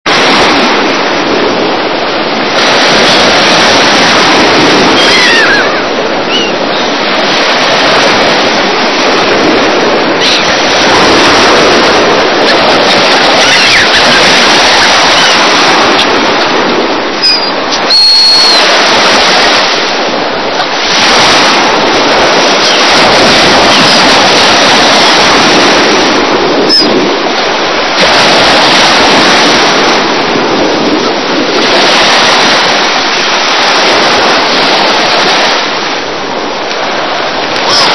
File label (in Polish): morze